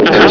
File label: pain; quake2; hal